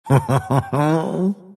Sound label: monkey laugh; Dota 2; monkey king